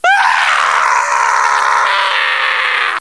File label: scream; deadpool